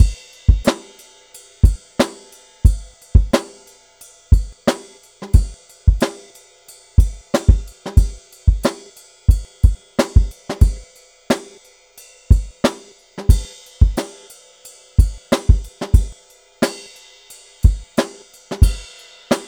Drum Kit, Electro Lounge; Sonic Foundry (Sony Creative Software); 090 Below Bangkok

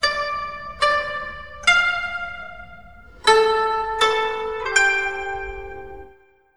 KOTO LOOP; USB Soundscan vol.60; Asian Traditions; AKAI

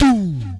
VOC DRUM, MOUTH PERC; Best Service Hyper Dance

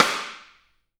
STICK, Drum 1 Dry; East Collexion

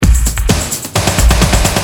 Hard, Drums Perc; Industrial

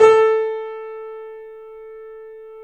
A -R, The Piano; InVision Interactive - The Piano

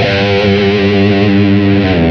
guit lead single note; Sonic Foundry (Sony Creative Software); Ugly Remnants VOL-2

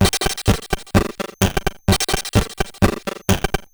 Bent TR505 (128BPM), Circuit Bent; glitch

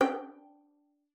FING G5, VIOLIN; Xsample VOL-5

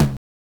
Tom, Genesis

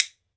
WOOD BONE, Ireland

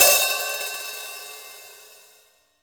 HH OP, Drum Dry; East Collexion; Drum 1

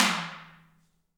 TALK DRMS; Heart of Africa 1; Spectrasonics

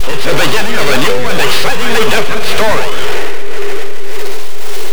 VOX FX; Big Beat Samples & More

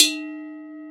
Almglocken, EMU Planet-Earth

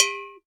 JANGKOQUI, Latin Hand Perc; E-MU Producer Series Vol. 2; More Studio Essentials; Composer

